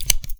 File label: button click release; ui; cnsmod